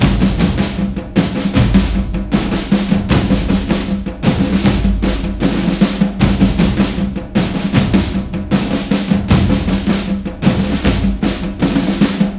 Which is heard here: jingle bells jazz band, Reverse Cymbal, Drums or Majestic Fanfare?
Drums